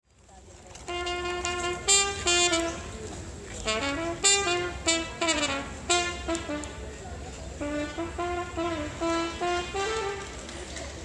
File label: Park Trumpeter